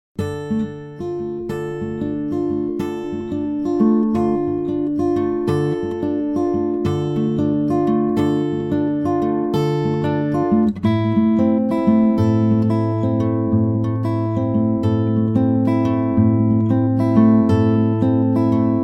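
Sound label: Music Guitar western phrase D minor; Hello Neighbor unused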